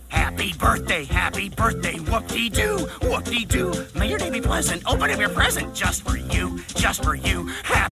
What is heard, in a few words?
sings happy birthday; binky